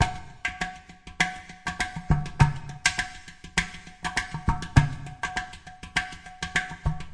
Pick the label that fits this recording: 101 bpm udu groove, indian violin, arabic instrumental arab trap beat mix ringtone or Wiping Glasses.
101 bpm udu groove